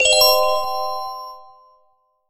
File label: confirm